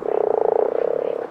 Toad mumble

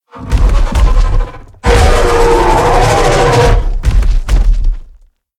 Yutyrannus sound

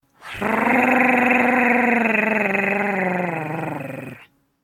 jerboa alert electrical storm

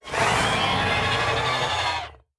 Galvarex Roar

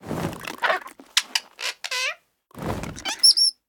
Featherlight sound

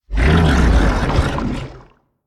Tuso sound

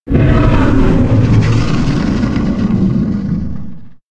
mega voc startled

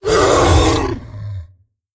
allosaurus startled

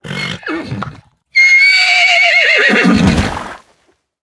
equus roar

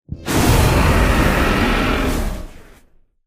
Magmasaur taunt

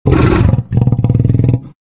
sabre voc mumble

